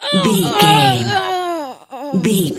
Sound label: voice female death